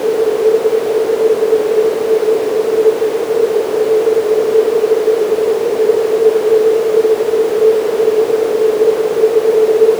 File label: noise 440